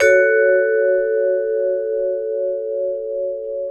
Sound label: VIBES; Houseworx